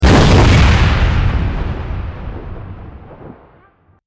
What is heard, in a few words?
explosion; demineur